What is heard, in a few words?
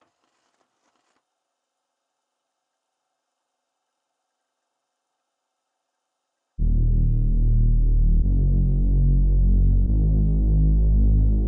146 BPM D# Min Bass; Trap Melody Loops; Loop Stems; Cymatics; Powers